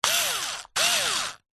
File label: industrial power tool electric screwdriver 2 short bursts